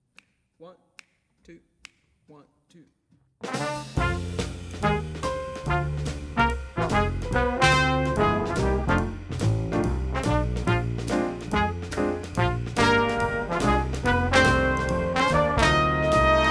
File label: Jazz Brass; 2018 All State